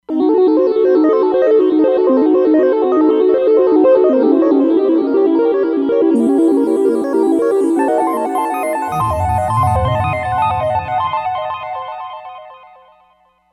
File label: Minor Thirds; Roland Fantom XR